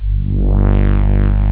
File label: slow fat instrument; sonix; Rise Of The Dragon; chipmusic